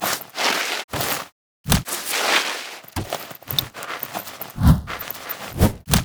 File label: zombie emote dance; LoL; brand zombie